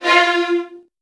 VN 16TH, 1F1; ILIO; Kirk Hunter

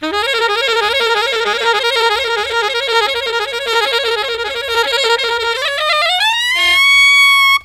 SAX, Killer Brass Riffs

